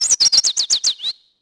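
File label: ambience bird; Tony Hawk's Project 8; XBOX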